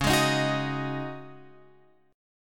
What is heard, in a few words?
C sharp Suspended 2nd Suspended 4th, C sharp, Guitar, Open F; C#sus2sus4; strummed; x,8,8,10,9,10